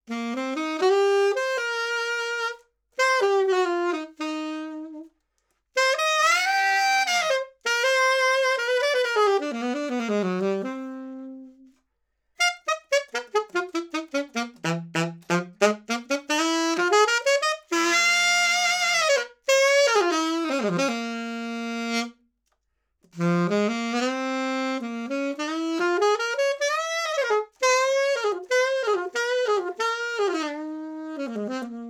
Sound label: Sax Alto 50cm L Mid